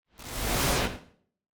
contained magic

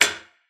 robotic click; soundscrate

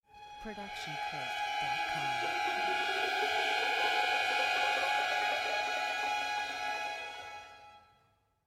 pizzicato and dissonance

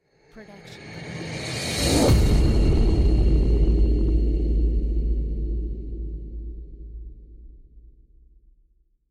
cinematic rise hit

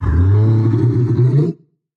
monster roar long; soundscrate

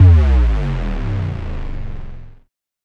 Bass Drop; SoundsCrate

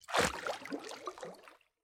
heavy splash

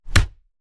Fight Impact; SoundsCrate

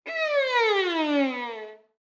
Violin Slide Down; SoundsCrate

